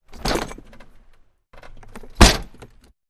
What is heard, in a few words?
Carriage Door, carriages